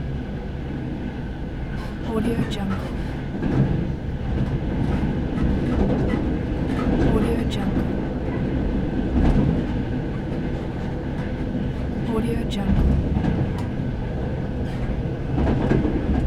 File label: inside train